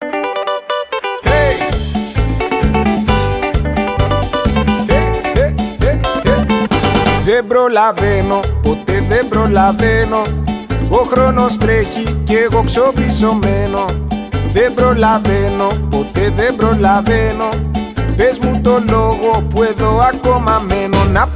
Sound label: greek reggae; ringtones